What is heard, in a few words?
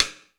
ROOM P STIK, ROOM KITS; Crypton - DrumBase5000